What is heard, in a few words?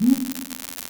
CUICA LOW; EdgeSounds; Drum Mashines; R-8 DRUMS